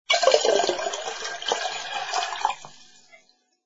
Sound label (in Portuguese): Vinheta Enchendo o copo